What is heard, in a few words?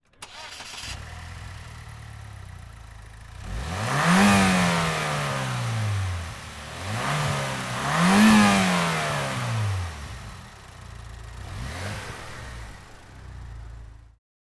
Car Show